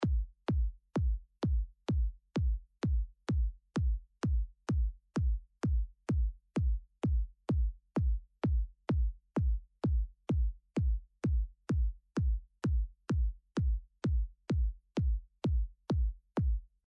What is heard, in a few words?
Kick